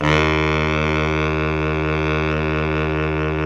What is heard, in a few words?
SAX B. SAX; SAXOPHONES; Roland - LA Composer vol. 1